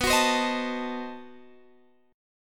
B 7th Flat 9th, down, guitar; B7b9; strummed

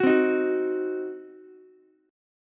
C Diminished C, Ukulele; strummed; JGuitar

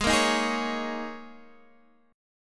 Ab Suspended 2nd Suspended 4th; x,11,8,8,9,9; strummed; JGuitar

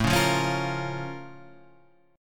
A sharp Minor Major 7th

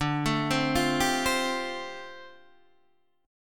D Minor 11th D down Guitar Open D

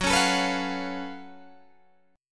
Gb Minor Major 11th Gb down Guitar Open G

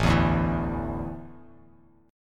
A Suspended 4th, Bass Standard; Asus4 strummed; JGuitar

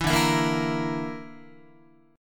D Suspended 2nd Sharp 5th D, Guitar; Dsus2#5; strummed; JGuitar

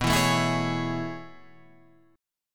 A Major 7th B; strummed; JGuitar

